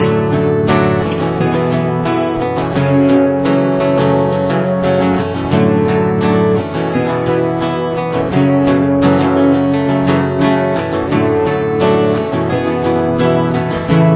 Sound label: prechorus, guitar